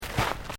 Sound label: FOOTSTEPS SNEAKERS GRASS STEP; Footstep Foley; Footsteps (Sound Effects:Human)